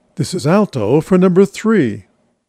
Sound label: Gloria Alto